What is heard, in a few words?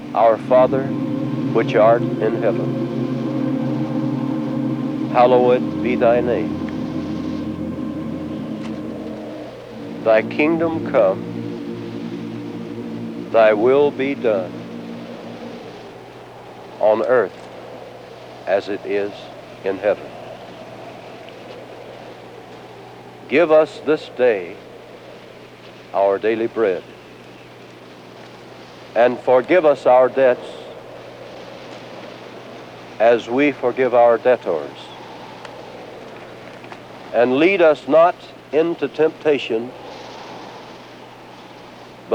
Amen Chorus; The Lord's Prayer